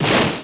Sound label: ROCK